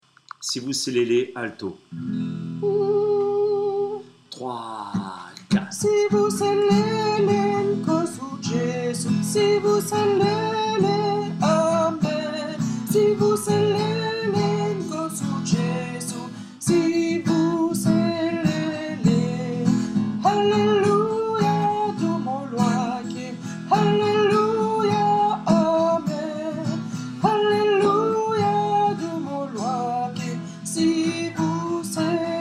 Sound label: sivuselele alto